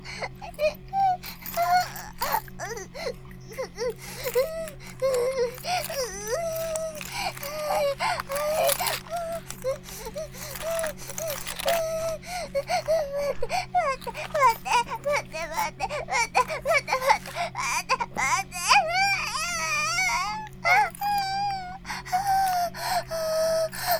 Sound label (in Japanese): バイブ放置; イクたびにわけて